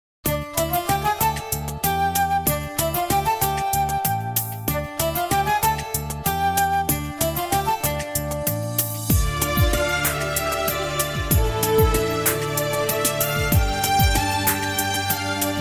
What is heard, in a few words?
X'an Devotional Karaoke